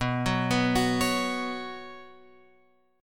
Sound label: B Suspended 2nd B Guitar Open F; arpeggiated; JGuitar